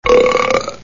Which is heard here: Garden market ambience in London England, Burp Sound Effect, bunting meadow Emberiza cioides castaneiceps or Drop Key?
Burp Sound Effect